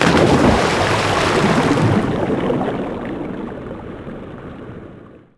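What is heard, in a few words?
in water; Shadow Man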